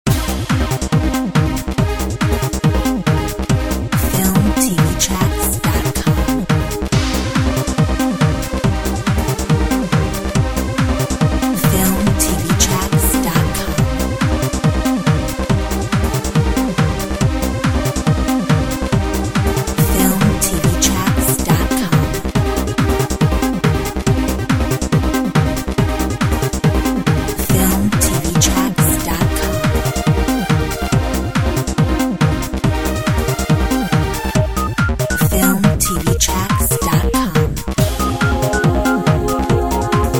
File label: Uplifter